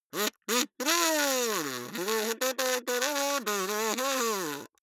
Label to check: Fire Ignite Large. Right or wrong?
Wrong